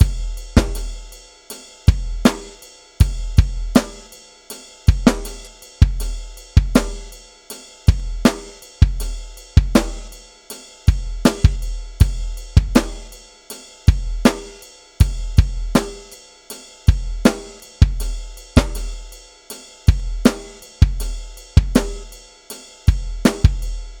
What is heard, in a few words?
Drum Kit, Electro Lounge; Sonic Foundry (Sony Creative Software); 080 Pinky